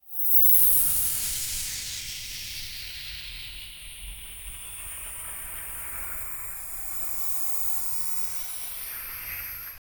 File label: ufo landing with static